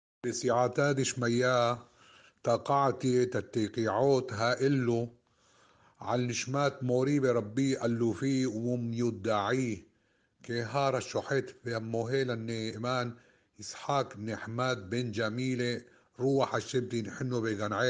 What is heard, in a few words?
shofar